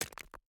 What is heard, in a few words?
environment pickaxe hit stone